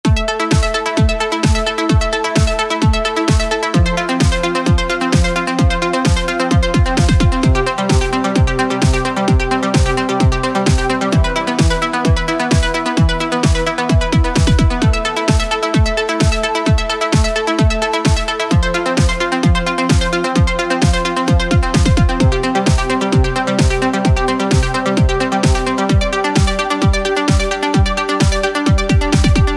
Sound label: Dancing Trance Ringtone